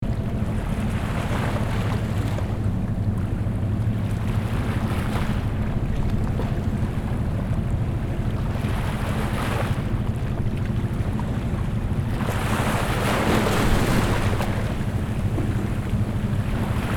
Sea ambience with distant ship engine

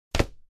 Hardcover book set down

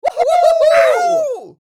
Wild crowd cheering

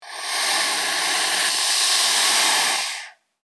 Snake aggressive hiss

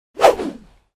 Rope swish fast whipping

